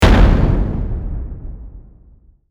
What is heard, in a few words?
explosion